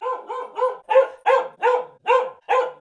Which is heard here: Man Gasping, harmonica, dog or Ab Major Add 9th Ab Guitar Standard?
dog